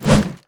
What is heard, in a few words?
swing; garrysmod